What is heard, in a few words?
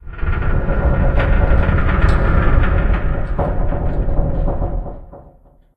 Metal Stress